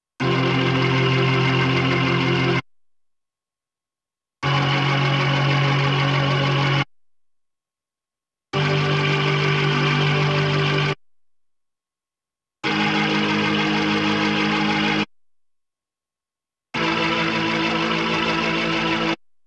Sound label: B3 Organ; Masterbits CLIMAX 8